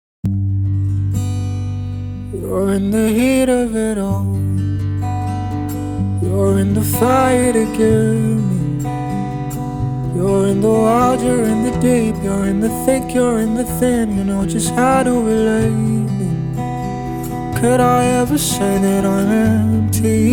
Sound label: Acoustic Version